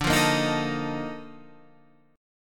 A sharp Major 11th D

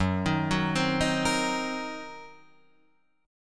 Fsharp 6th Flat 5th down Guitar Drop D

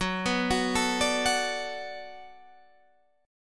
D 6th Fsharp, chord

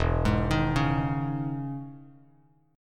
E Major 13th down Bass Standard; EM13 arpeggiated; JGuitar

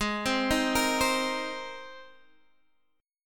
Ab Major Add 9th Ab Guitar Half Step Down

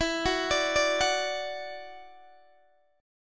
E 7th Suspended 2nd, down, Guitar, DADGAD; E7sus2; arpeggiated

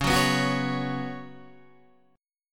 Db 7th Suspended 4th Db down Guitar DADGAD

